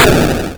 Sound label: explosion